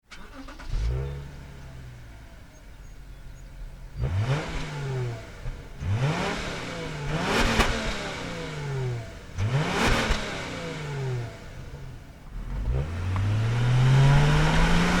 Subaru Legacy 3.6R

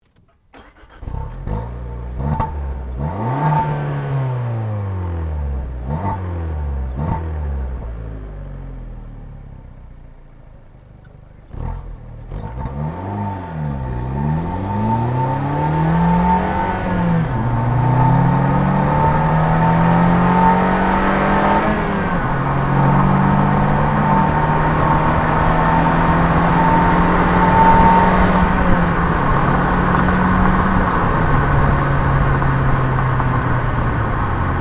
Fiat 500 Turbo

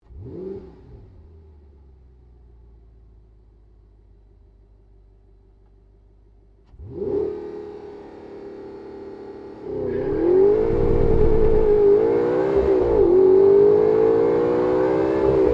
Audi R8

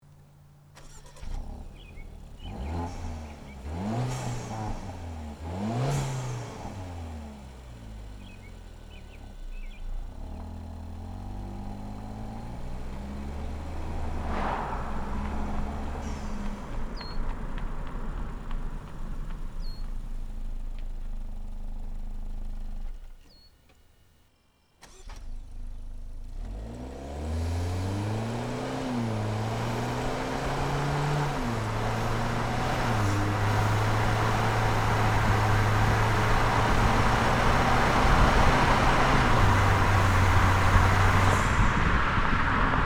Ford Fusion 1.6

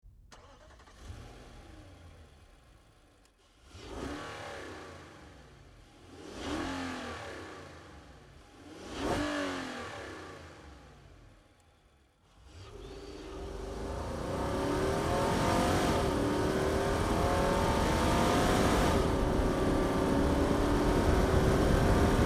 Infiniti QX56

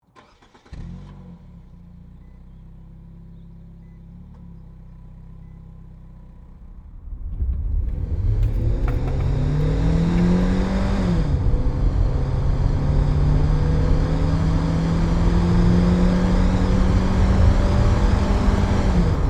Nissan Versa 1.6

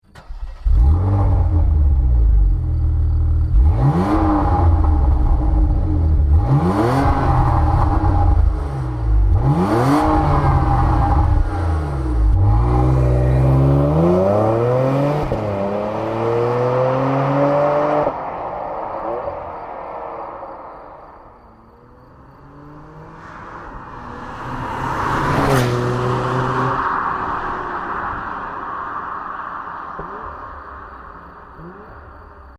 macan

